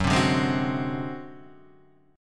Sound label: Ab 7th Flat 5th Gb, chord, guitar